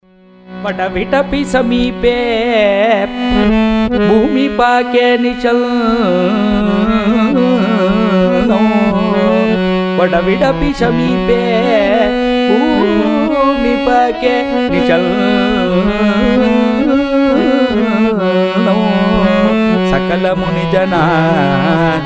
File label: bhajan; Shivanama Sankeerthanam; sanskrit; vadavidabi sameepe